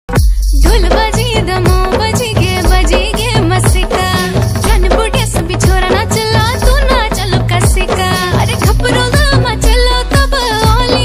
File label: uttrakhandi ringtone